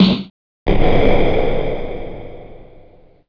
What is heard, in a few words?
snare